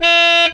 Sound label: Electro FX; Ueb HE Electro FX